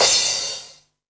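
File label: cymbal, dance drums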